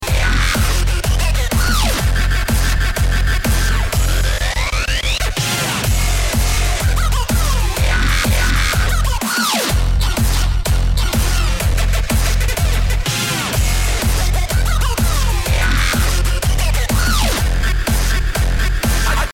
Trance Hard Core

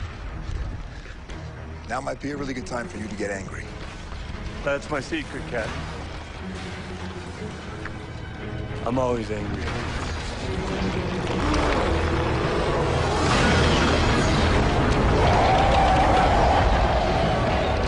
Hulk angry

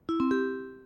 message tone

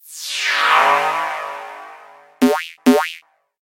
SMS HTC

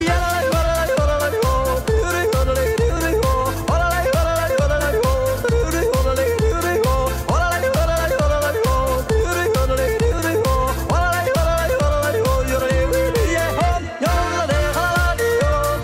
Best yodel

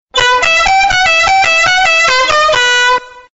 Car Horn

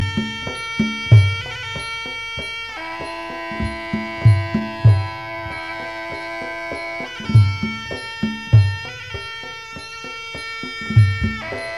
Meditation; Waikru

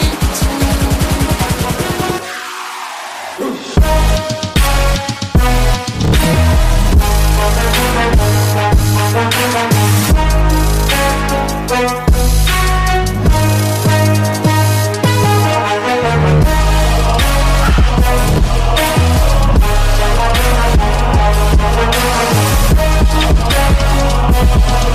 Chimes

